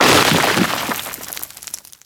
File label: Roof Crash, tunnel; breakable